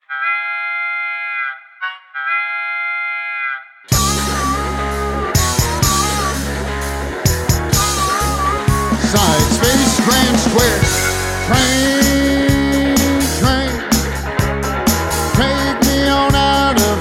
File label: Train, Train Vocal